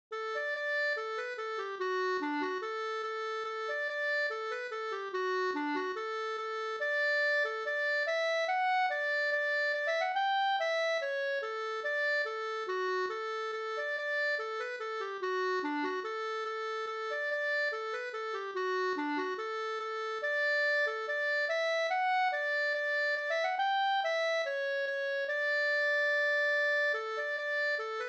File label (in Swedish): ganglat; Majstangsmarsch e Arvid Lundqvist Fogdo Sd